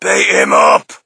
crowd, shout; Mike Tyson Heavyweight Boxing

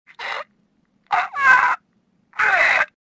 choke

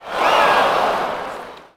Ooh, Crowd, reactions

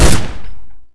Mach Gun Fire

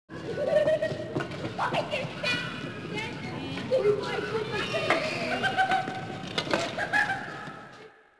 houses kids; Tony Hawk's Project 8; XBOX

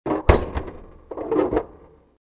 airlock close, doors; movers; Soldier Of Fortune II Double Helix; XBOX

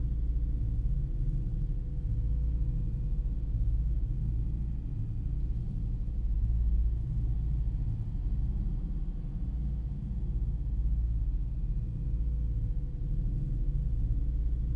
landing ship hallways ambience; Star Wars Obi Wan; XBOX

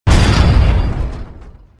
ore press land, movers, platforms

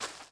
fs sand, footsteps

